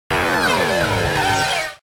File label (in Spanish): Grito de Dusknoir; Gritos de Pokémon de la cuarta generación